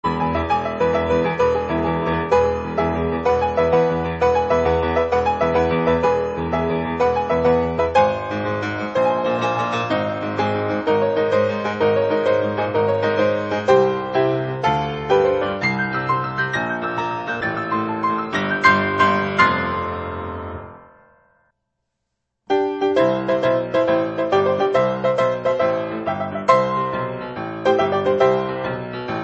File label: medley (grand piano); 1942